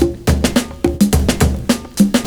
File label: PERCS, 70 s Breakbeats